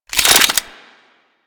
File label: Chain Lightning Rifle Reload A; Crp Chain Lightning Rifle